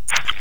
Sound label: lock; ODST